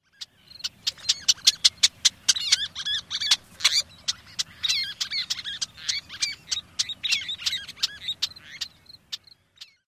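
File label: Least Tern chit alarm calls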